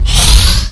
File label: charge, weapons